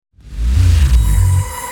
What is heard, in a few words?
hit complex short